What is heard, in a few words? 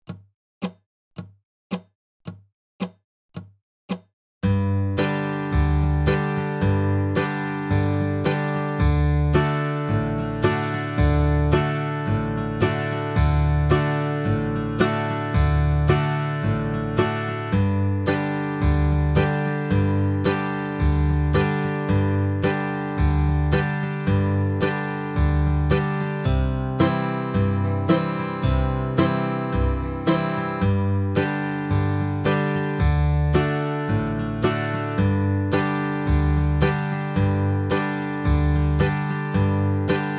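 Key of G 55 BPM, Dobro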